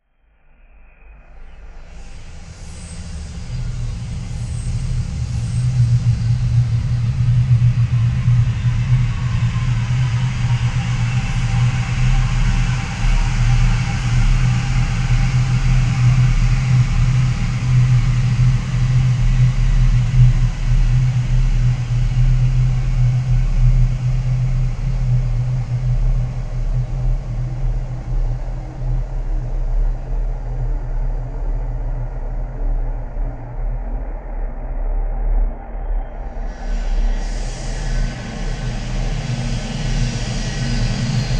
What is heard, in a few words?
Ambience Soundscape Scifi Distopian Elektromagnetix; Soundiron; Subways & Streetcars